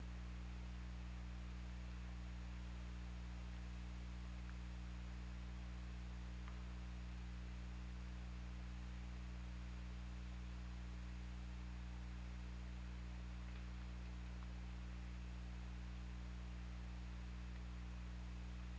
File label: Orca; OrcaLab